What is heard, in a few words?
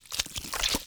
gather meat